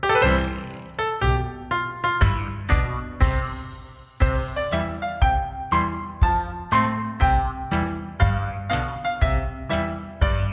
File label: ringtone; im a little teapot